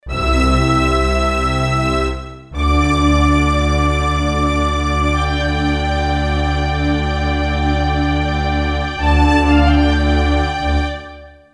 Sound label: Dramatic Orchester Jingle